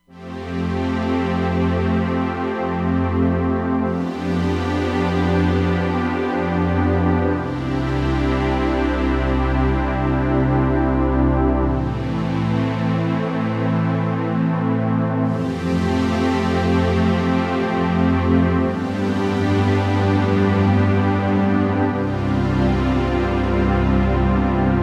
OB Slow Str, Roland Fantom XR

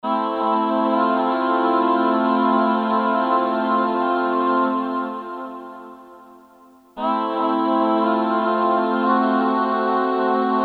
DVP, Korg DSS-1, Voices; Voices II

